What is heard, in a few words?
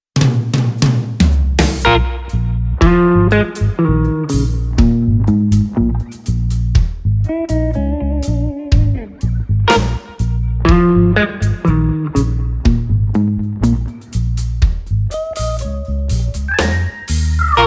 French Blues